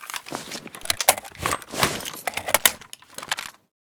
svd reload

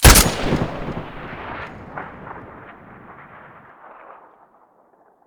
svu muzzle mono

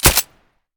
m9a3 silencer stereo; beretta

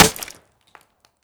wood, bullet collide; STALKER; IWP

